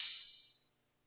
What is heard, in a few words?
808 open hat; Dr 202; Percussion